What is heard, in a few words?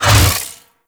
Hammer Shield Whoosh A; Crp Hammer Shield